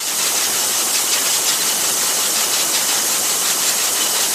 Bottling Plant Loop, factory

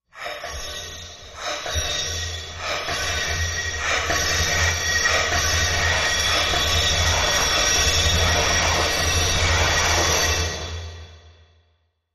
Alien Ice Cream Maker, machines winches

